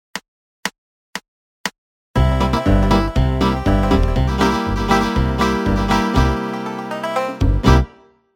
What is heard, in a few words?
main backing track; hamo salihbegovic; lick of the week no 2